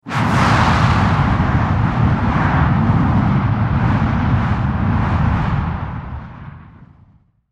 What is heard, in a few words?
Fire Fireball Wave; Flame Sound Effects; Flames (Sound Effects:Objects)